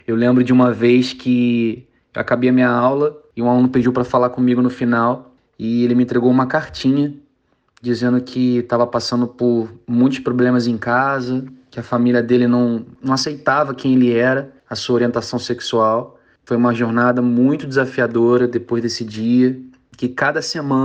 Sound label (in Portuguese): MUSICA